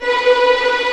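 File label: Vio tremolo B3; Digital Sound Factory Virtuoso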